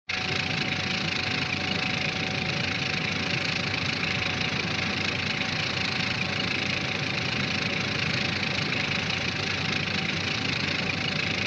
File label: Retro Anime Caterpillar Tread High